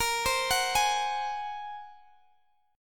A sharp 7th Suspended 2nd Sharp 5th A sharp, Guitar Standard; arpeggiated; x,x,20,17,19,16; chord; JGuitar